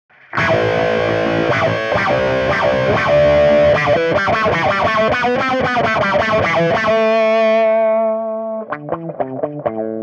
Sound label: ADSR wah